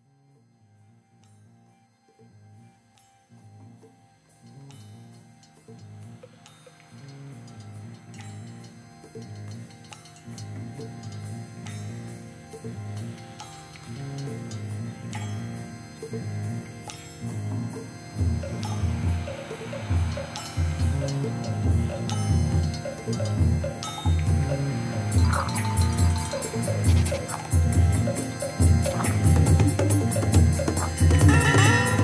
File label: metal dog